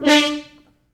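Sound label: French Horns stops, D3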